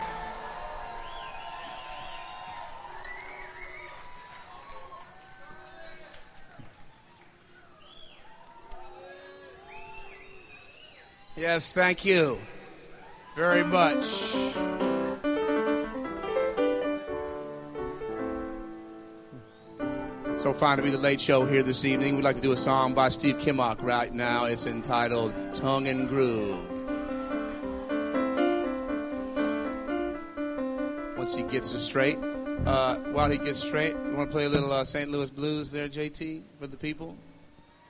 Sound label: crowd